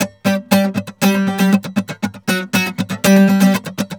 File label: GUITAR SALSA